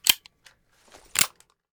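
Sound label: Weapon 44 revolver jam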